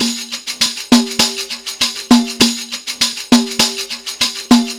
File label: PERCLP, ArabianTraditions; USB Soundscan vol.43; AKAI